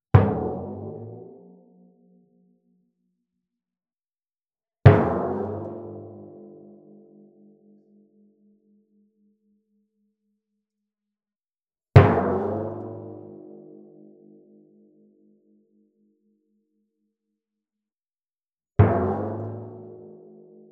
Timpani gliss up; SkewSound; The Fool

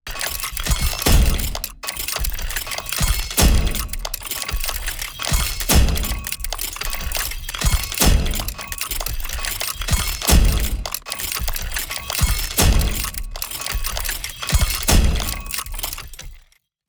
Steampunk Large Factory Machine; David Dumais Audio; Steampunk Mech SFX

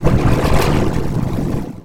Underwater Breathing; Soundopolis; Fienup

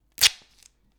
Duct Tape mono short rip; GDC 2020 - Game Audio Bundle; Hear and Now Sound - Tape Tape Tape!! The Essential Sound Library for Tape Sounds

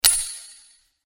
Sword Sound; FXHome; Fighting Sounds

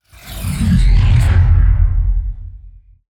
Just Whoosh; SoundBits

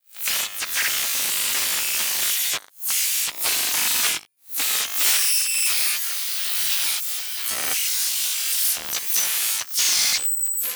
Malfunction Glitch Long; Bryce Raffle Sound

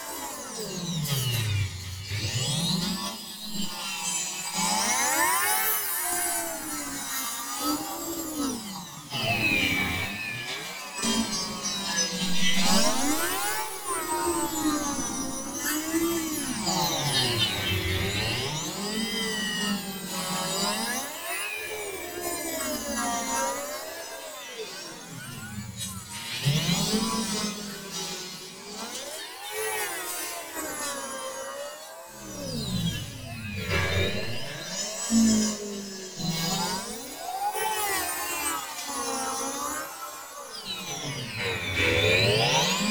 Chaotic Sci-Fi Noise - Loop; The Sound Pack Tree; Unreal Ambiences

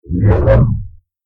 time start or stop; FXHome

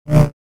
Saber; FXHome

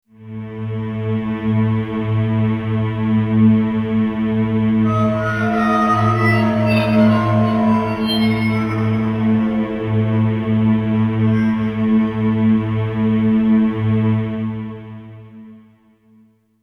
Orchestral Phrase; FXHome

